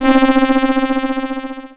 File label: Production Element Title Transition Data Transmission